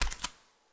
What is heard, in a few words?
Footsteps Barefoot One